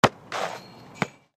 Football, kick the goal, hitting the net

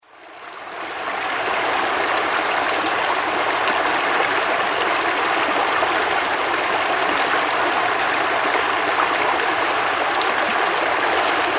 River flows

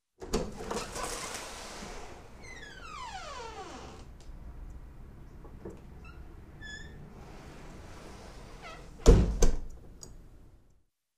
wooden door opens and closes with creak

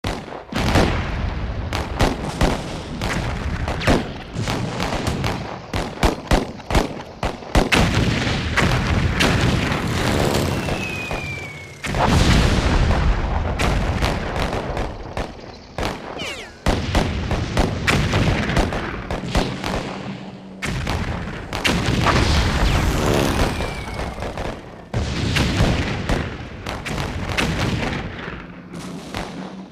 War, shootout, shells, aircraft; explosions

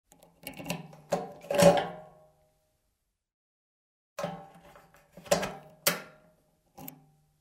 Motorcycle gas cap

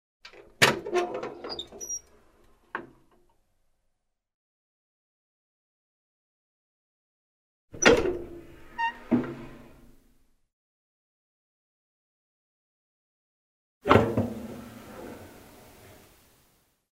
Open, close the iron door of the oven; fire

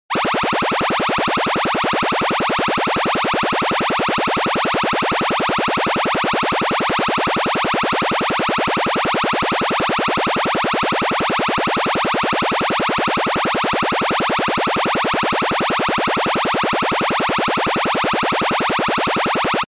Electronic signal

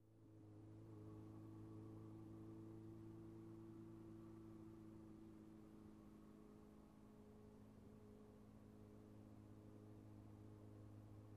Motorcycle approaching, turning off

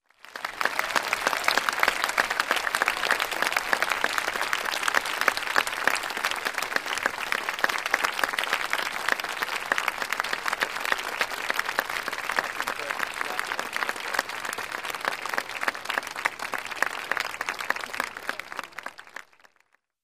Applause from a small crowd; standing ovation

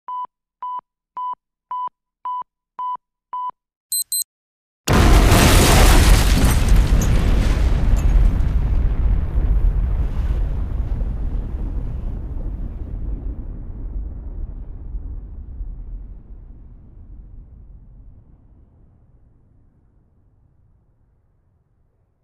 Bomb countdown beep, bomb explosion; explosions

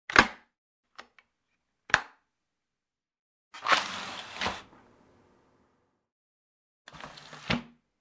Wooden window open, close; windows

